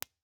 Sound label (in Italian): ir nastro anecoico; magnetostrittivi; 3 elementi 6m